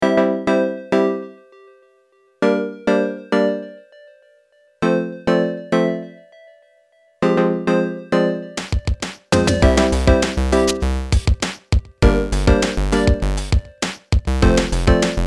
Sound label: warm summer nights; miru far from home game concept audio